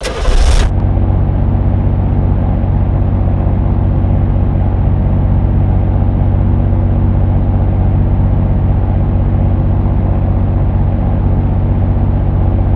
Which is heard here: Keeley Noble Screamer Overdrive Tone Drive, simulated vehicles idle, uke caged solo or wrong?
simulated vehicles idle